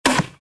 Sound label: grenade launch mm-1